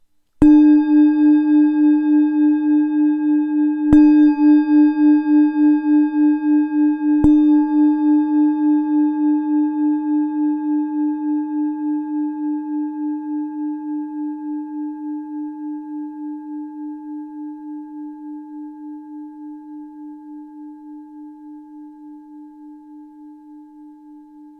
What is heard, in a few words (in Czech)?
tibetska misa; d4